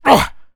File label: Melee Grunt, Human Cop; zps; Humans; MeleeGrunts